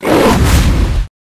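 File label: Dragon Skeleton buff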